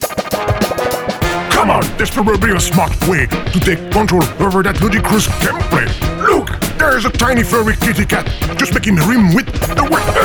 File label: Music Rap Cat Hint; There Is No Game: Wrong Dimension